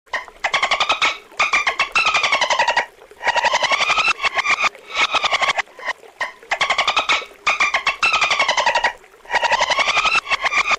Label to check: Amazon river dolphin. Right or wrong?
Right